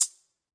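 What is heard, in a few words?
shaker; Western and Latin Percussion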